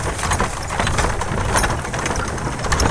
Horse Drawn Wagon By; Electa Quinney

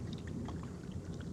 Mississippi Water; Electa Quinney

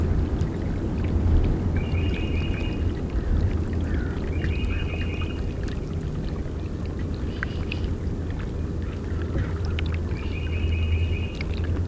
Mississippi Water; Electa Quinney

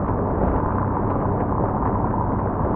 Car Road Noise; Poetry Project

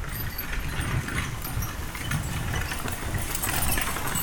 Horse Drawn Wagon By; Electa Quinney

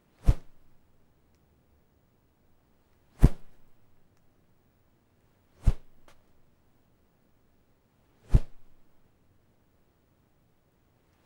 Whooshes Sngl Rope Sof

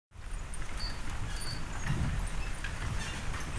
Horse Drawn Wagon By; Electa Quinney

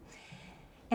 Birds

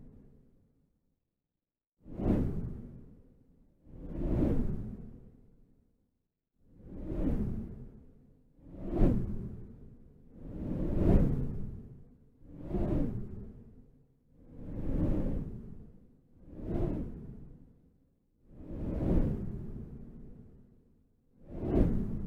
Wooshes Windy

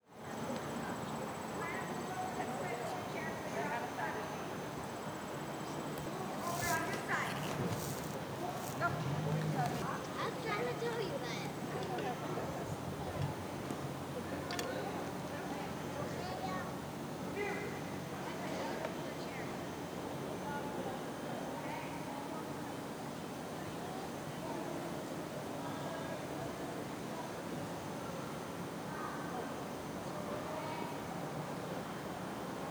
PowWow Bowl Quiet with Children; Menominee PowWow 4 Aug 2023; Menominee Homecoming Powwow August 2023

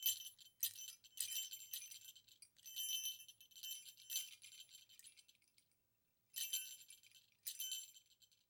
Bells, Small, Jingle, Quiet, Long; Electa Quinney

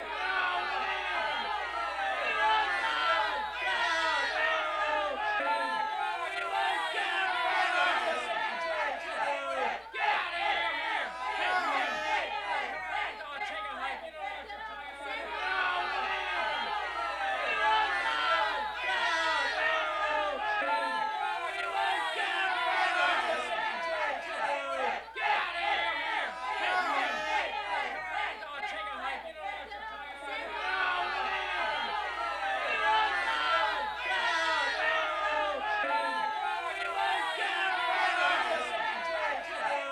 Angry Men Shout Chant, Crowd; Poetry Project

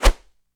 Whoosh, Arrow, Feather, Thump; Electa Quinney

